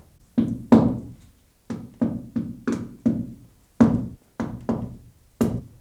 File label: Hammering